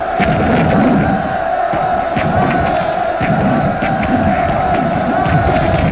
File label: melee